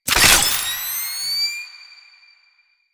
Laser Flare Activate; Warframe; Lasrian; Lasrian Soldiers; Abilities; Prone Lancers

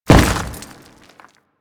Step Dirt, Footsteps; Warframe; Grineer

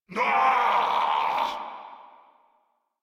Death, Grineer Male; Warframe

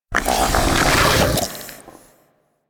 Infested Drop Pod Retract; Warframe

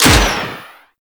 Missile Shot; Warframe; Weapons; Archwing; Powers

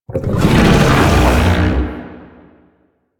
Tank Infested Vocal Moan; Enemies; Barks; Warframe; Lasrian

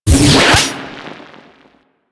Fire Stop, Weapons; Infested Tentacluster

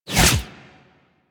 Flak Cannon Alt Fire Pass By; Warframe; UT Flak Cannon

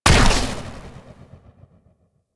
Amp Barrel Fire; Warframe; Operators; Amplifiers

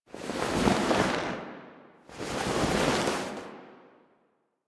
Foley Arm Turn; Cinematics; Operator; Warframe; The New War; Throne Room

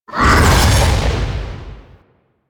Golem Melee Swing; Warframe; Attacks

